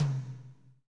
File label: tom, Pop Country; Northstar; Drumscapes Roland